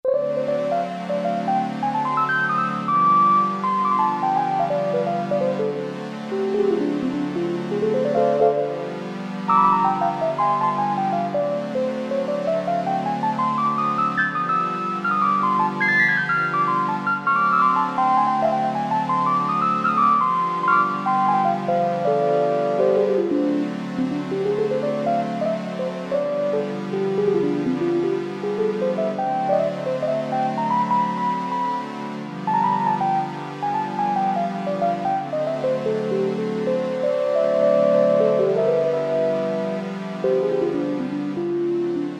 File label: Split - STRINGS 4 - ELECTRIC PIANO, Oberheim OB-8; Splits & Doubles